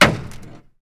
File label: hit cart; GTLive